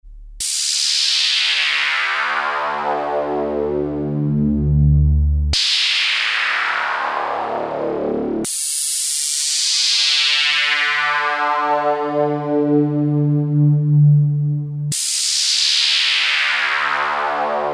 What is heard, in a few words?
ppg space